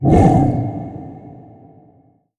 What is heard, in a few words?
monster; MonsterVoiceお手軽パック